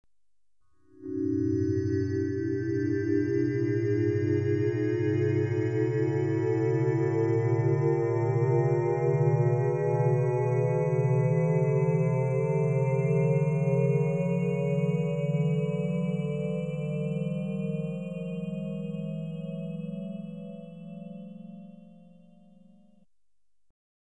Particle Beaming Device (Run Up), Electronically Generated Sounds; BBC Sound Effects Library